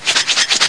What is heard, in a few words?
BAD MARACAS